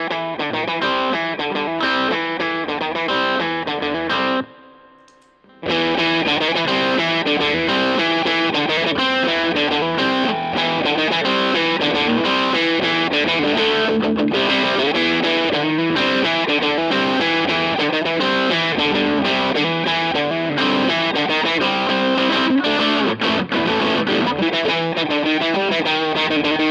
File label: DC Junior Fat Fuzz; Harley Benton